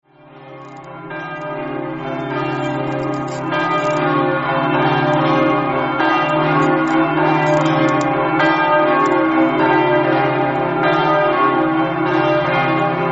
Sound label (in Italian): campane